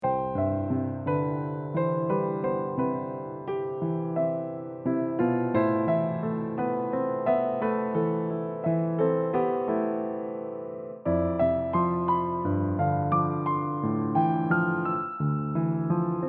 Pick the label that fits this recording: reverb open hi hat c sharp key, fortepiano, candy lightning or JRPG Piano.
JRPG Piano